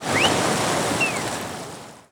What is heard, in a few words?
taunt flip; weapons; dota; slark